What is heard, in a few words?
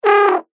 Cartoon Baritone Loud High Short Note Musical; Blastwave